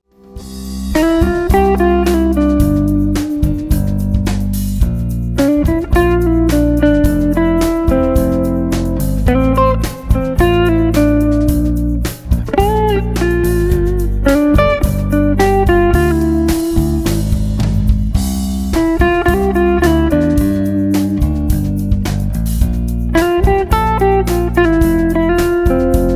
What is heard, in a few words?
Dinner Jazz